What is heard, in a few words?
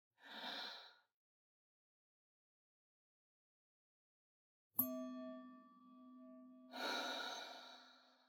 Bell